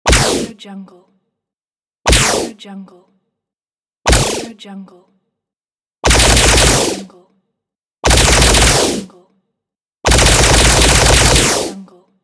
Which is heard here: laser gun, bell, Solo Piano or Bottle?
laser gun